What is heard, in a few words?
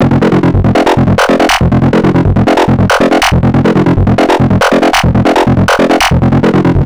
Broken Up Arp; Synths; Arpeggios; Electronic Point Blank